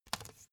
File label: cd take out soft